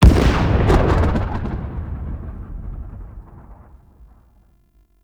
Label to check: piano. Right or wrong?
Wrong